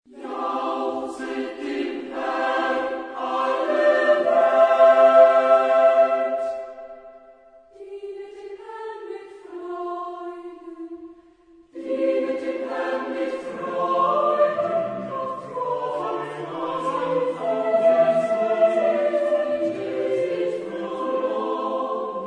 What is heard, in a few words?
Motets, chorus; Mendelssohn; 3 Motets Op69; Jauchzet dem Herrn alle Welt